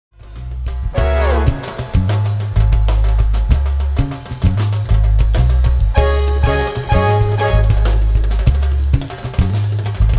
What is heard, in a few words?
World Beat